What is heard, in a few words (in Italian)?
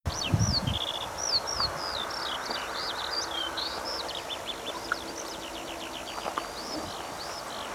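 Canto verso allodola alauda arvensis sky lark feldlerche alondra comun; bioacustica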